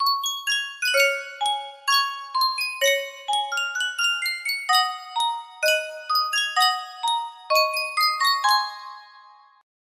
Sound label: Sankyo miniature music box